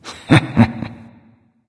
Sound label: "heh heh"; smite; voicepack; ullr